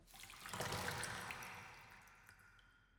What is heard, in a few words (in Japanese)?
温泉(水移動)